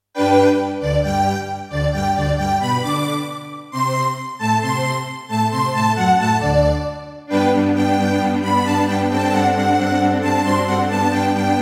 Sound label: Yamaha DTXpress; Mozart - Eine Kleine Nachtmusik partial; classical